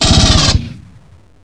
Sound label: fire, sentry; svencoop; amckern; avp10-guns